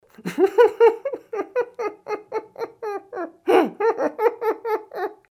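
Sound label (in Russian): звук смеха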